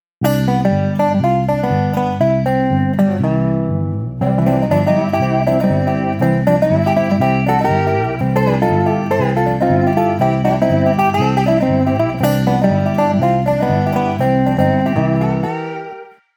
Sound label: country field guitar